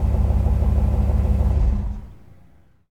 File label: Z28 Off, Automobiles